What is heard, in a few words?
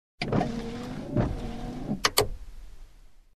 Cars